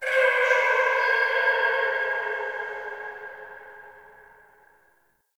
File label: Root Cave Distant Chatter; Ambience; Neathuns Root Cave; One Offs